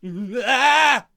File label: Samurai Attack Vocals